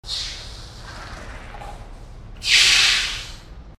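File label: car one shot, harbor; Source Engine; tf; cp harbor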